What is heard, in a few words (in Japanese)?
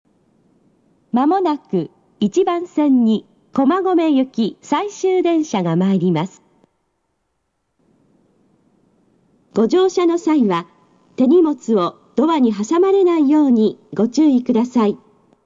honkomagome 1bansen sekkin